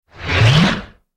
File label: Sci Fi Whoosh, Sci Fi Whoosh Evaporation Disintegration